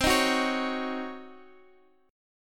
C sharp Major 7th Suspended 2nd Suspended 4th, chord